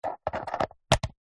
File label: household mains power plug fumble then plug into extension block socket; australia